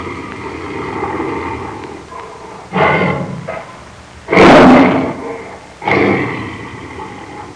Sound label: Tigress heavy breathing